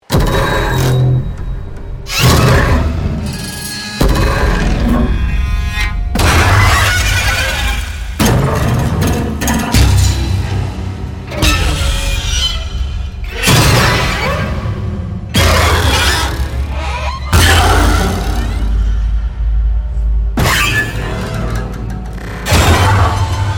dark jetes, stringache; silence and othersounds

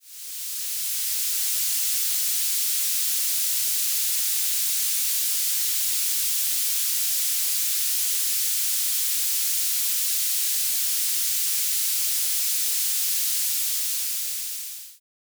frying; noisepalette; sirenfx

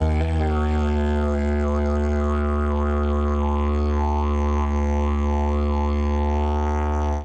organic didgeridoo elongated; instrument sound library; organic didgeridoos; nova sound

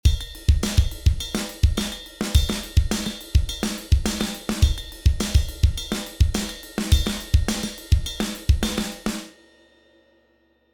drums stage custom rock; magika drums; elektra magika

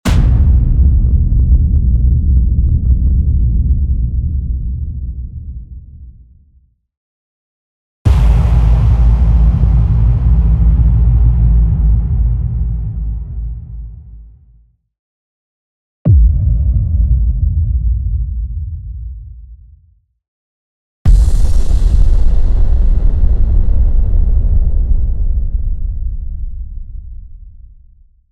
nuclear booms; iceberg audio

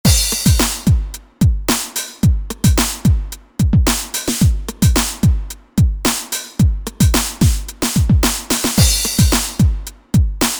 d909 multi out; superwave

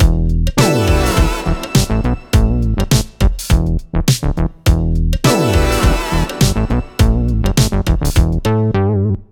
cz bass; synth bass center; audiolounge soft

